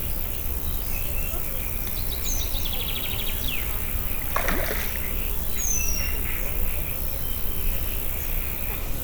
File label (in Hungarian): halcsobbanás; Tiszababolna